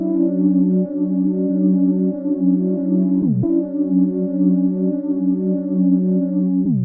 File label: pad trappy 140 Bm